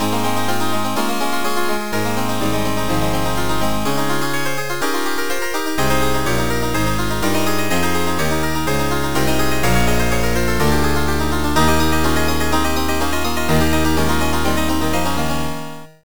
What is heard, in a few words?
fugue 12 et